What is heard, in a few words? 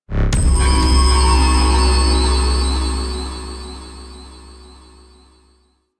Sentient Ramsled Breach Call; Warframe; DE; Vehicles; RailJack; RailJackEnemyShips; Sentient Ramsled Agent